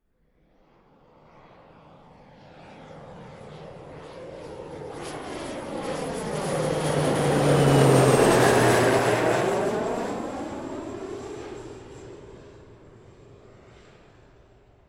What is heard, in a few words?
Jet Flyover